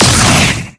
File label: Shoot; TreeRex